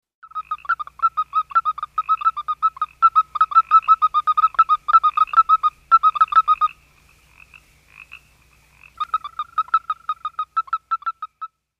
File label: California toad